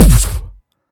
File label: umbrella rush kick; lilmana; smite; vamana